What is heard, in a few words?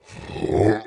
huh a, painfalling; covy involuntary; elite